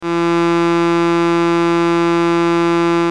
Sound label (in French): KLAXON LAITON CHROME